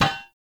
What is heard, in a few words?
Incense Burner Contact Impact; Objects